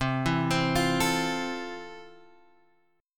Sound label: F Major 7th C